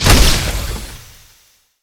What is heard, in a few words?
napalm cannon; kf2; weapons; trapper